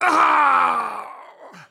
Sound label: Heavy T Death; Phoenix; Counter-Strike Global Offensive; PS3 Windows Xbox 360